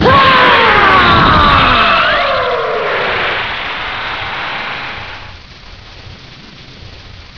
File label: savior release